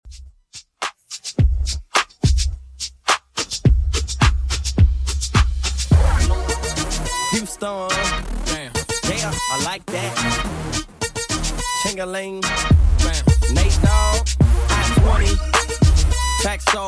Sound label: Redrum 106bpm